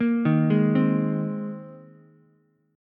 A sharp 7th Suspended 2nd Suspended 4th, Ukulele chord; arpeggiated; JGuitar